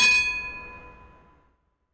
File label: eurotram end, gong